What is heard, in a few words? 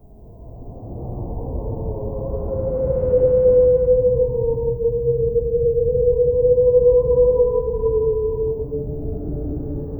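horror, haunted house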